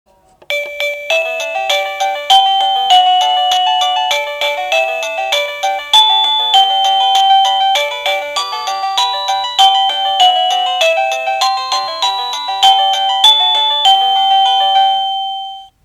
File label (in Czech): melodie